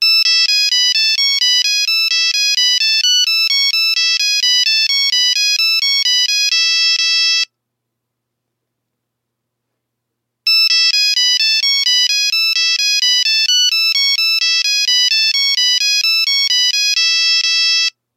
Barn dance, Nokia 3310; Nokia Ringtones; Nokia Design Team SoundCloud

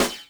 snaredrum, Yamaha RM 50

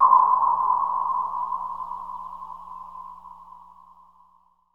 Noise Shot; Sound FX

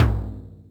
Yamaha RM 50 Toms

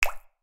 Water MMC insert; Nokia Ringtones; Audio themes

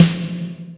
Snare, Yamaha RY-30 SNARES; Drum Samples

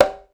Bongo, Drum Samples Alesis SR-16 Percussion

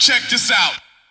checkdisout, audioloops

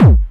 Bassdrum, Roland System-100; Drum Samples

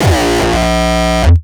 kick; shock. Ultimate Kicks vol. 2

